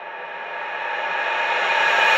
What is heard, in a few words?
reverse, vocal sample